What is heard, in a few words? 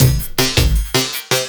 LOOP, 160 BPM, Drum & Bass